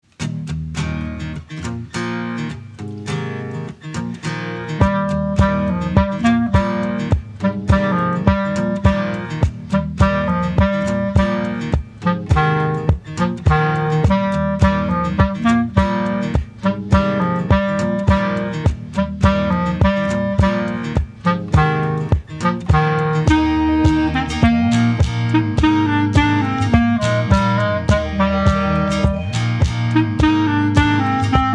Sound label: clarinet